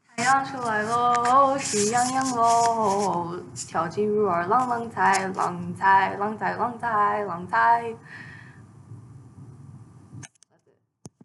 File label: folksong